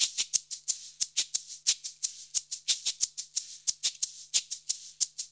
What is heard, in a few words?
90 MIX, Percussion Loops